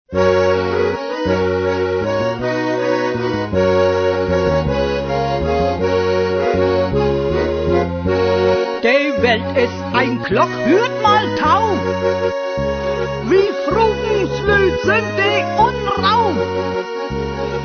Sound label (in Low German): song, De Welt is ein Klock